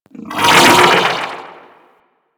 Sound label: Vocal Pain; Amalgam Override; Warframe